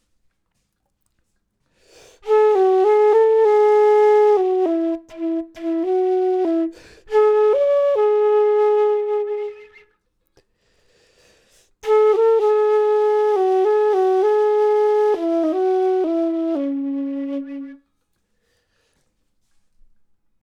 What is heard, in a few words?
flute; logic